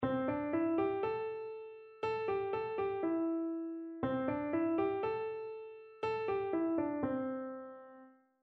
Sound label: Pentatonic Etude